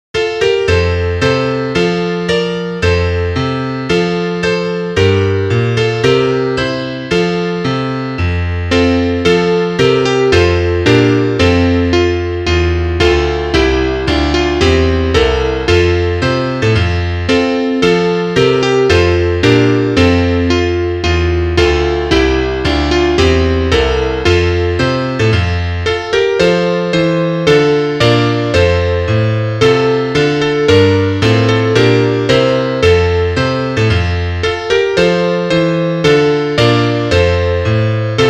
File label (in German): Klavier; aus grauer staedte mauern